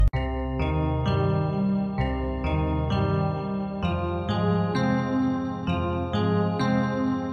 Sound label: Galaxy Y Notification